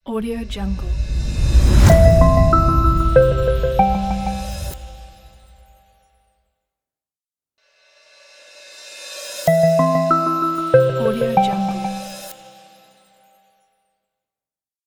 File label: modern reveal logo sound; terrasseproduction